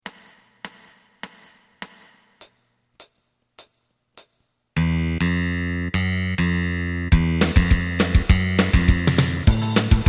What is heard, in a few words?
lead